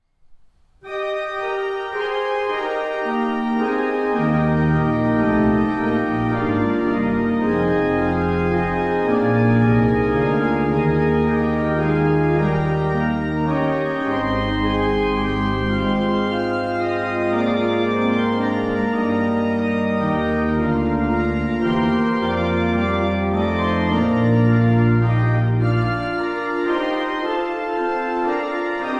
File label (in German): praeludium es dur, orgeln; fink; schemmerhofen